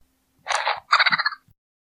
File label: Wiimote Sound; Samus